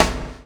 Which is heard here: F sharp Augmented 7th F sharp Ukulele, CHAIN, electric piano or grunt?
CHAIN